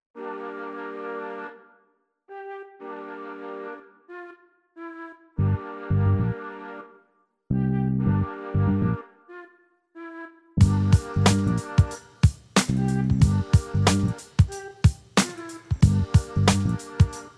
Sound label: funk